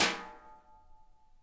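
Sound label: Snare Brush, Drums; PX7; Digital Sound Factory Protean Drums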